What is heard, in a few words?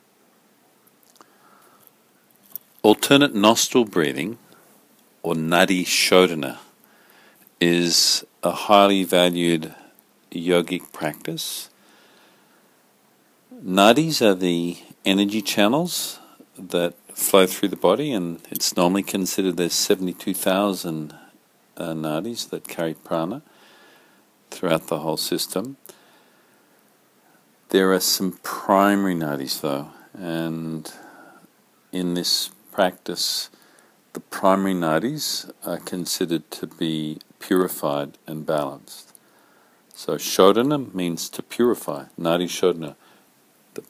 Alternate Nostril Breathing PRACTISE; Yoga Teacher Training; Breath and Mind Body Connection; Breath Practices